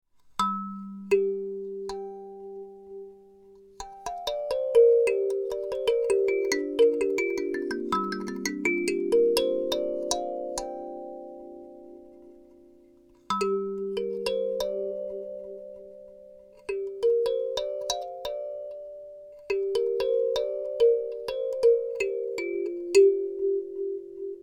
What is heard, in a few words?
Box Penta Gm, kalimba